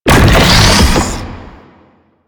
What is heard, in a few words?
Slinky Dead Zone Charge; Warframe; Man In The Wall